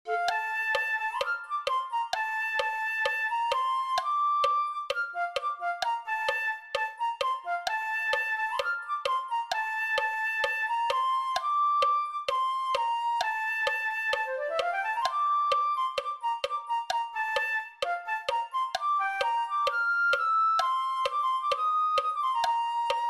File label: flute